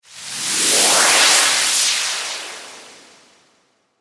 Space Whoosh Ascend, Space, Whoosh, Ascend, Descend; Scifi; 5.1; BlastWave